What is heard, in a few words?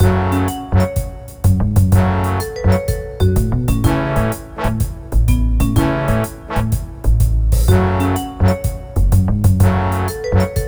RPG pub; Edifice